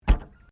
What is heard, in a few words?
Car Mazda Rx7 1983 Series3 Exterior Boot Close; Cars; Vehicles